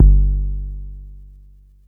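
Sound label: Long bass drum, Jomox Airbase99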